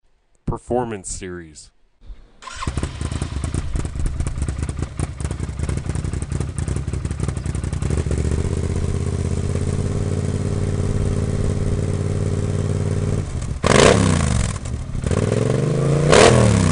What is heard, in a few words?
ac wildcat 1000